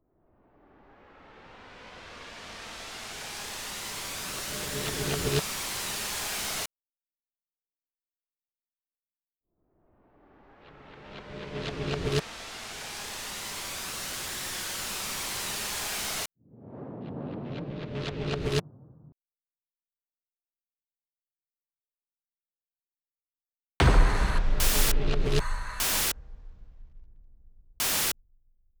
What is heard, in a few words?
perc & noise fx 75bpm